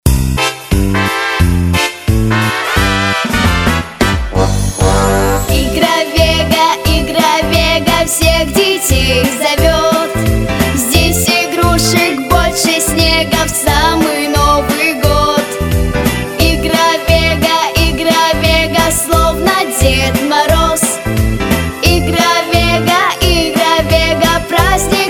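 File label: Jingle Bells vocal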